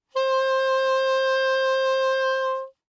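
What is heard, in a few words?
Soprano Saxophone vibrato ff C5; Woodwinds; Bb Soprano Saxophone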